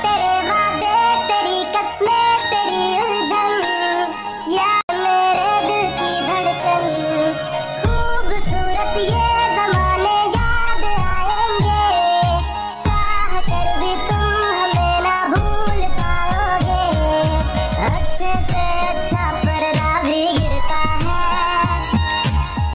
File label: latest bollywood ringtone